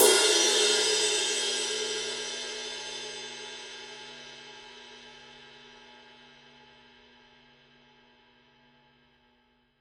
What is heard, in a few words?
20 heavy ride edge, paiste 2002